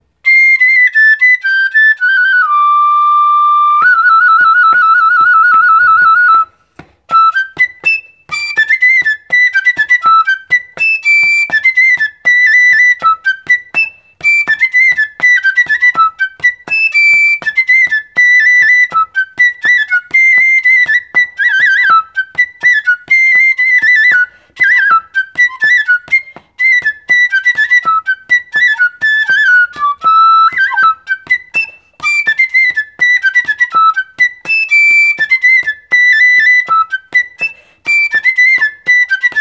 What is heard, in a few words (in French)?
Bourrée de la st jean